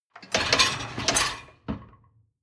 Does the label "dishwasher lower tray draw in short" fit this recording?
Yes